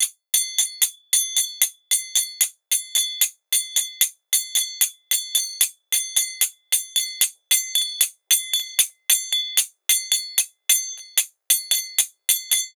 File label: Tull Loop BPM 75; waves of bihu vol 1; Gully Loops